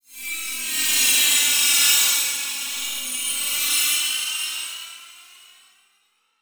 Transition Feedback Warble; Adobe; Production Element

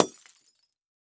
Crash Light Bulb Smashing On Concrete; AdobeCC

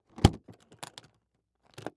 Household Briefcase Close

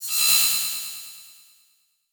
Transition Feedback Ring; Adobe; Production Element

